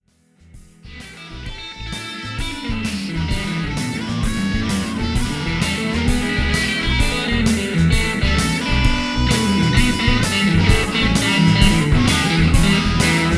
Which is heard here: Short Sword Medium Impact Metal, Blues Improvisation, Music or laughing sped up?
Blues Improvisation